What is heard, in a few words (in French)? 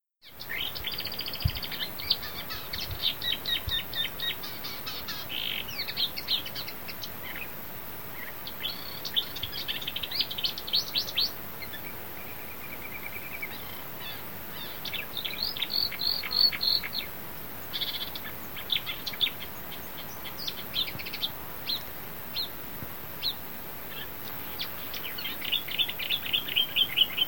Verderolle Pologne